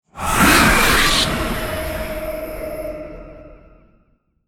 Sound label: Tortured Scream; Ninja Tracks